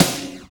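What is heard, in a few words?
snare flange; SNR; Sn Modules 5; Roland - Rhythm Section; Snares 5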